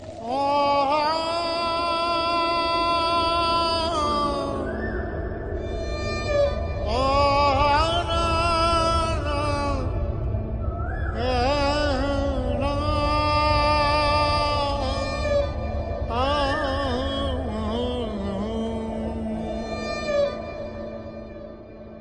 sad Theme

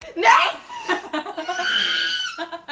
chimpanzee

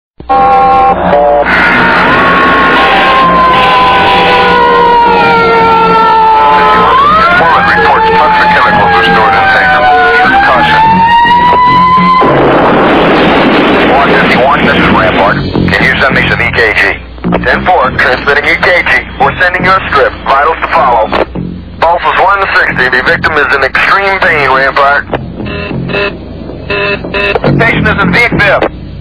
Emergency Theme

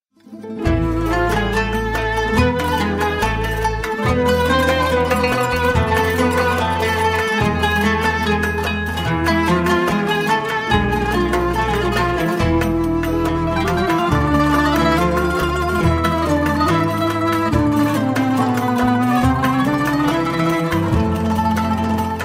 Turkish Art Music

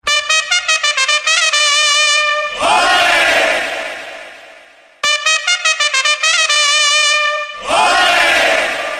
Stadium; Ipl

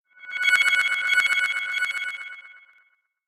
Htc One X Standard

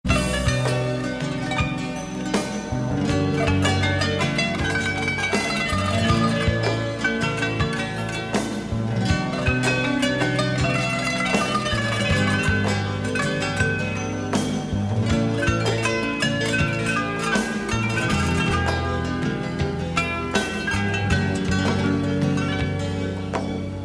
Sweet Fine Tune

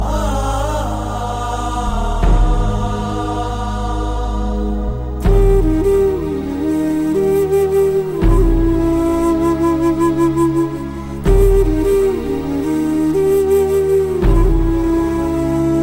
Bgm Sad

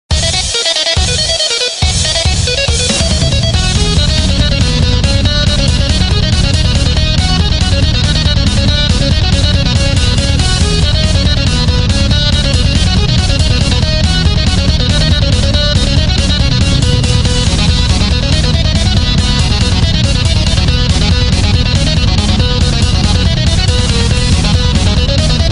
Hard Rock

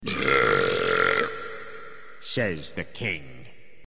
Burp; family guy

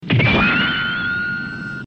mech; gundam

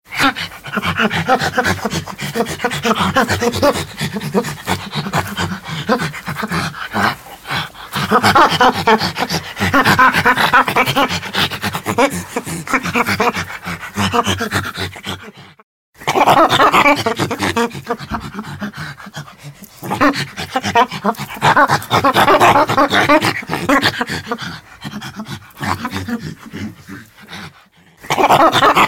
Mating Chimpanzee

